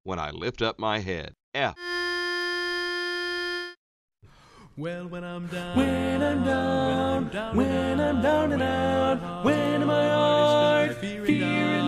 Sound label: TENOR